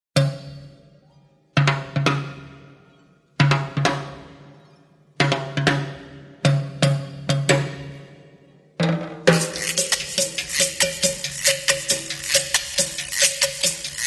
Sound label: Percussion